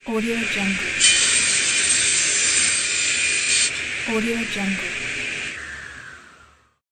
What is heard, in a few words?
circular saw